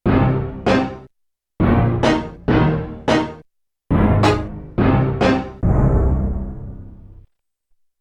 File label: Orch Hit II; Yamaha TX16W; Orchestral Performance 1